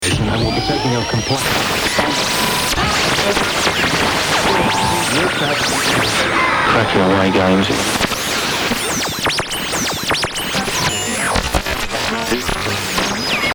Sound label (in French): RADIO